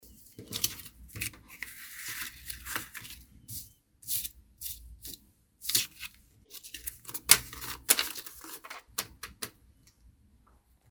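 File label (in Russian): dollars fanned out on the table, 7 banknotes, and gathered up; Звук купюр, долларов, отдельно и в пачках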